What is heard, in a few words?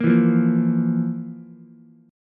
F Major 7th Suspended 4th F, Ukulele; FM7sus4 strummed; JGuitar